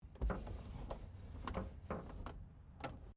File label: CAR MAZDA RX7 SERIES3 EXTERIOR WINDOW OPEN; Car Mazda Rx7 1983 Series3; Cars (Sound Effects:Vehicles)